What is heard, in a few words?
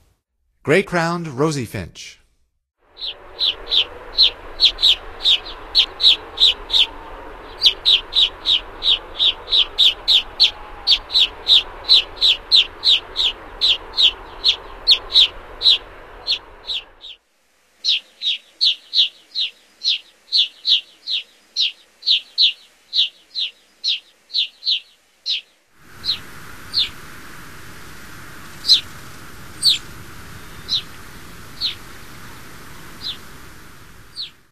Gray-crowned Rosy-Finch, Songs of Birds of North America; Stokes